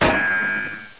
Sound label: headshot; cstrike; codlife